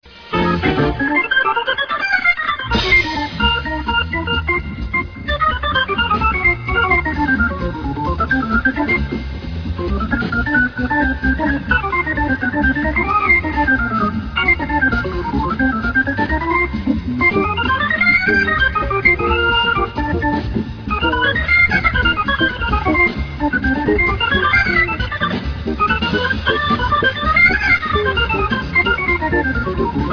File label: hammond